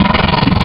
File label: Seal; Animals; Wavetables; Synthetic's Serum Collection; Cymatics - Animals for Serum - Gold Edition Wavetables